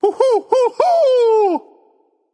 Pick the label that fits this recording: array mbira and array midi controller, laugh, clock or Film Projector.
laugh